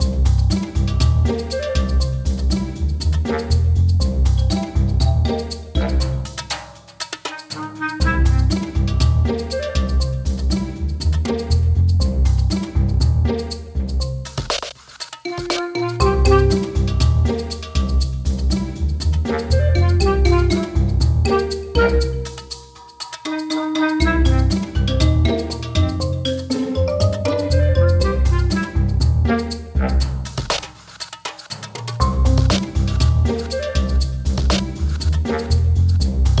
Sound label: bgmusic